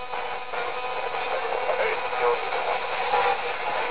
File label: ghost box